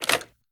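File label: gear rattle weapon large; viper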